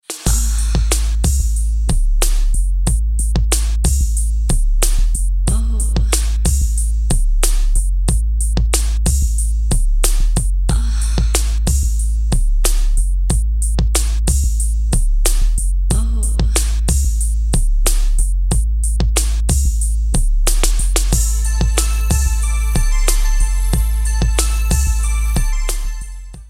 Bass Night

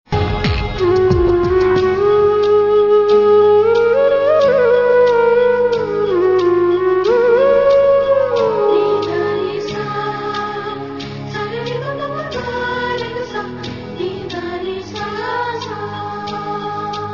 Fluite

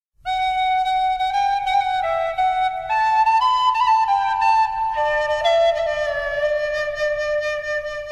Flute Message

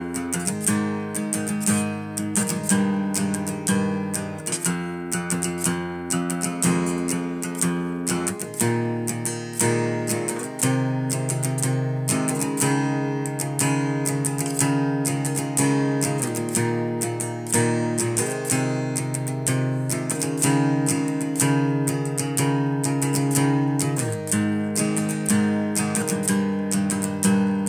Simple Guitar

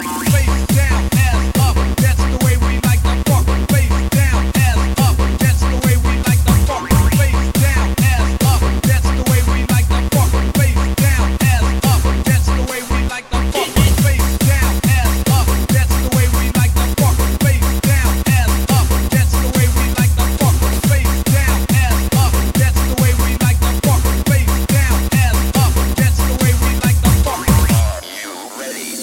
Bangin Techno

